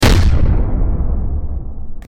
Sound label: hit